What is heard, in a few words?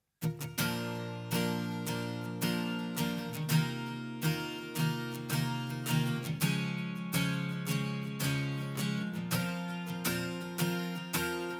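acoustic guitar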